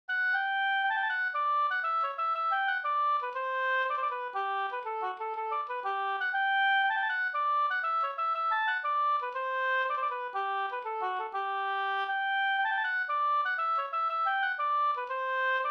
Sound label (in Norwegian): pols